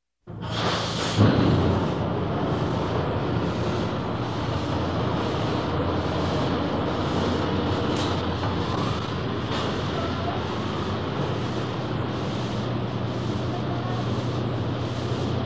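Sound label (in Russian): chistka v salone mashini, cleaning a car interior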